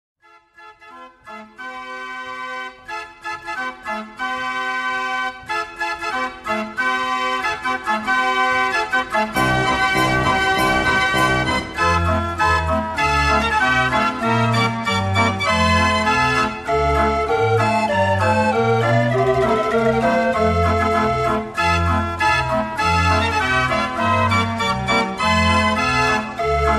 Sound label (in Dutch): draaiorgel; De Klok